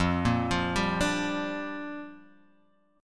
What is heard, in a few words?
F 6th 9th Suspended 4th F, chord; F694 arpeggiated